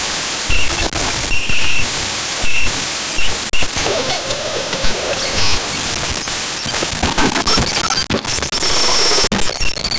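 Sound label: rapid sneezing male, allergy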